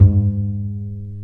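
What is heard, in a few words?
STR Cb Pizzicato, strings; Roland L-CD702; Cb Pizz 1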